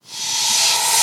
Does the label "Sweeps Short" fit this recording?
Yes